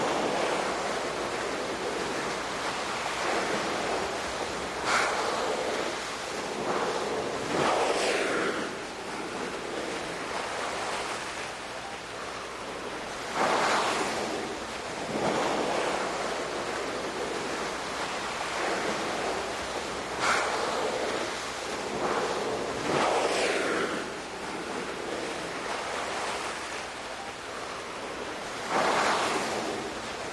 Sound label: whale spouting sounds